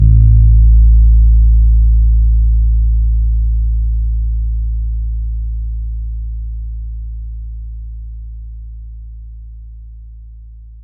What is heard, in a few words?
Synth Double bass A